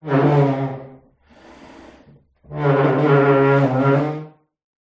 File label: household dining chair wood slide laminate floor distant room reverb; zapsplat